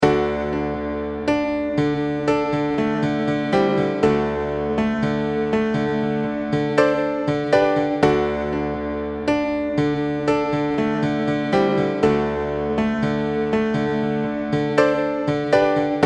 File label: Loop piano